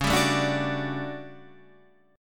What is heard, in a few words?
A 13th C sharp, guitar chord